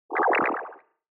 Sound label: Onoma Negative Low Short; オノマトペ ネガティブ; 低 短